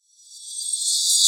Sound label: Hissing Approach